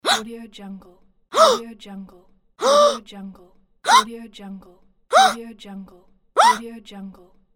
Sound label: female gasps of surprise